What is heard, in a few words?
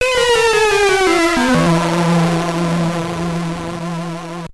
Game Over (SID Stereo); Scorpion; commodore 64